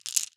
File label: Marbles Rattle